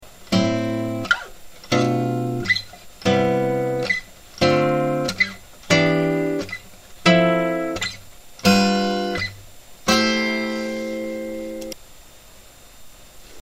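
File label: Harmonic Natural Minor, guitar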